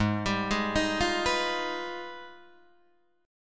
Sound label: G sharp Minor Major 13th, Guitar DADGAD; G#mM13 arpeggiated